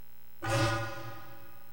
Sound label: Deselect Sound; Sky Odyssey